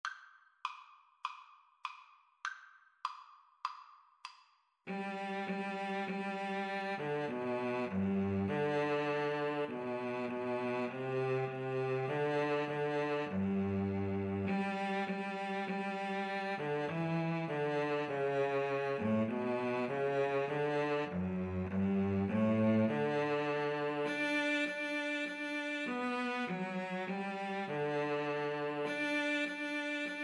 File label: hark the herald, Viola Cello Duet